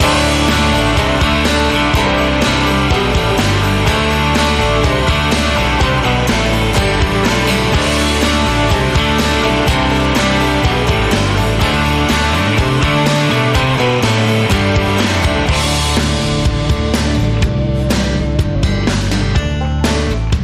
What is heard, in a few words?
Banjo